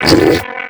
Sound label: HURT; mahlendur